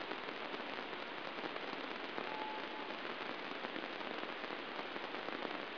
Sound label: Radio Meteor Detection